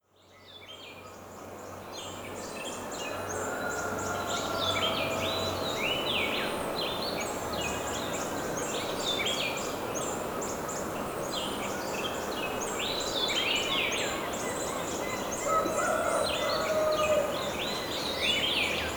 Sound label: peri-urban forest dawn chorus